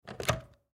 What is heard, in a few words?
Telephone Cordless, Telephone Cordless Pick Up From Charger; Telephones; Household; Blastwave